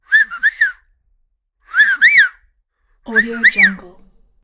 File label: whistles; game sounds